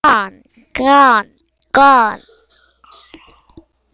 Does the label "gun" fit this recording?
Yes